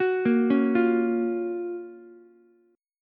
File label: Gb Major 7th Gb Ukulele; GbM7 arpeggiated; JGuitar